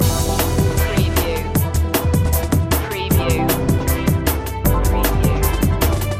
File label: car advert music loop; Soundtrack / Action / Chase